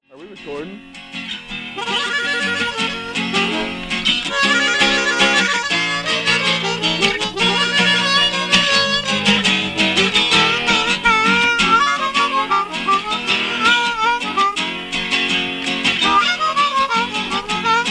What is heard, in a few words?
Filler Space w harp